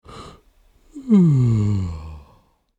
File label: A Deep Gentle Yawn Human Sfx; Yawning Sound Effects